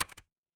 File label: office keyboard